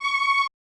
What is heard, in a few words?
QVIB F#C5, Orchestra; AKAI Advance Orchestra Upgrade 97 Vol.1